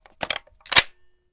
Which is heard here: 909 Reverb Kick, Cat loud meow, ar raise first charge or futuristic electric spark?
ar raise first charge